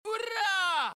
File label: hoorah